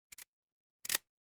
office camera

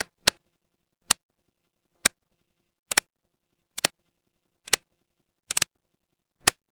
mechanical switch

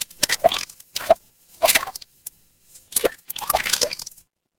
water splash

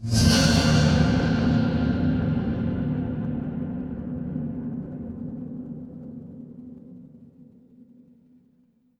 horror stinger

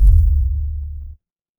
retro game coin

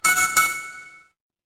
feedback success

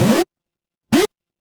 retro game jump

